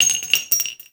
Shell; Dual Vipers; Weapons

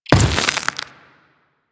Ice Proj Form; Warframe; Corpus; Arachnoid Camper Terra; Abilities

